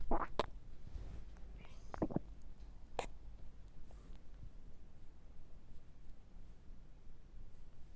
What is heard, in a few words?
baby swallow up